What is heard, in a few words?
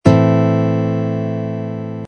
a minor, guitar